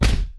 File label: thud; jacob